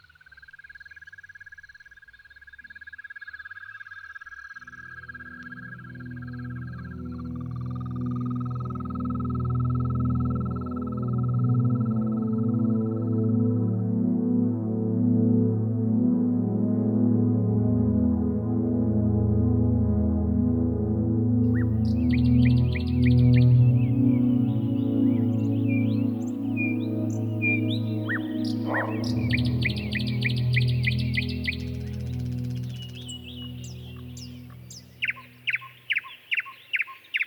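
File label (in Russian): noch seredina leta finlyandiya nightingale; solovei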